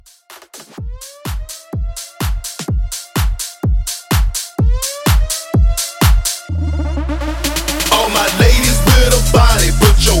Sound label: House Mixshow 126; DBL Twisterz